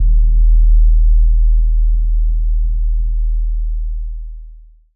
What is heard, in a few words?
single bass drum hit